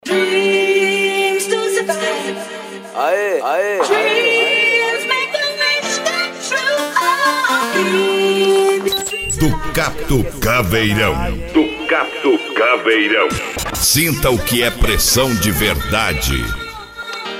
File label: especial mega funk